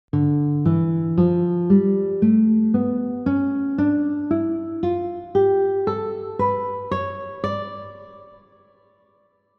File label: D Melodic minor scale, guitar